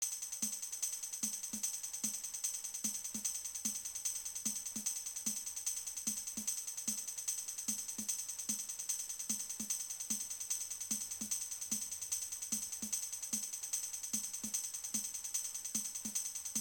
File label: Boss Appeared; Bombaman Extra Ammo; MSX2